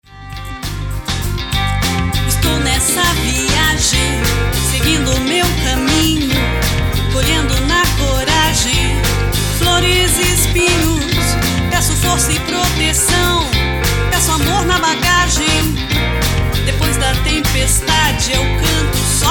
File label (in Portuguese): BLUES DA VIAGEM